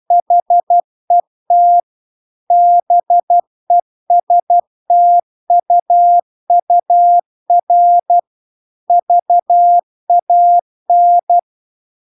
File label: CW; VERON